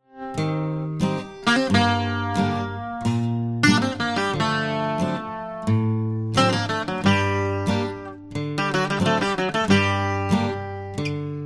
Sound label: trance